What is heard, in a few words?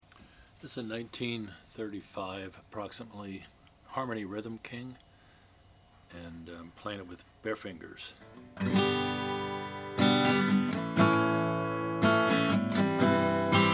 harmony rhythm king iced tea sunburst; 1935